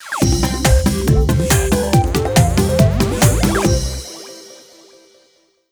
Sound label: Stab; Buzz! Junior Robojam; PS2